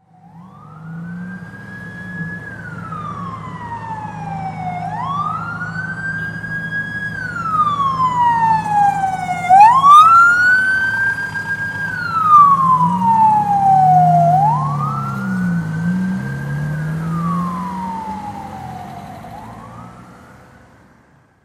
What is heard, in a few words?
ambulance